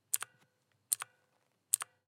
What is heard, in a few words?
blue switch keystrokes